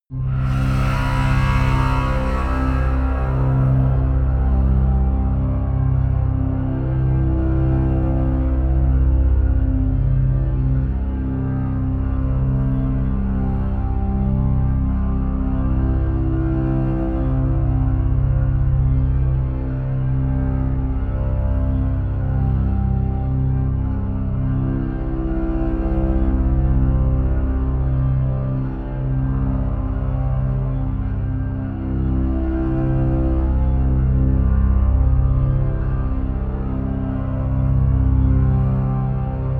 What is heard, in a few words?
drone metal strings